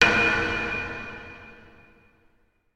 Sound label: impact metal pole large reverberant industrial